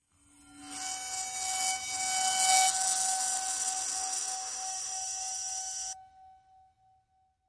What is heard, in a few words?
BBs Vibrate In Bowed Bowl As It Is Bowed; bowed metal